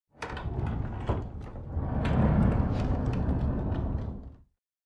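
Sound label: Dresser Rolled On Wood, scrapes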